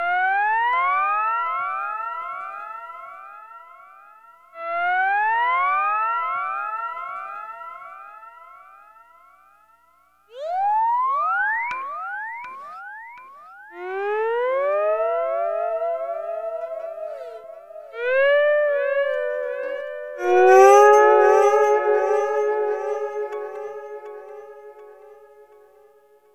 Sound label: Gtr Fx-F#; Lounge; Zero-G Kocktail Kollection; Construction Kitz; Sunrise Mixes 082; 90 s Sample CDs